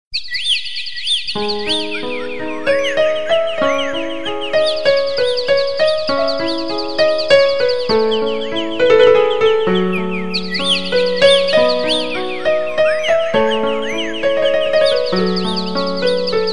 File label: love piano bird ringtone